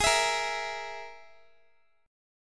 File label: G sharp Suspended 2nd Sharp 5th, Guitar; strummed